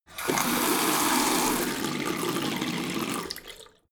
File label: tap flow medium short; haus garten